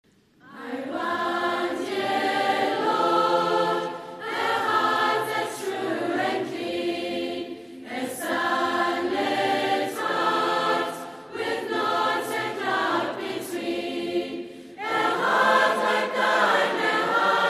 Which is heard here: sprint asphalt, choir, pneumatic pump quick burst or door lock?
choir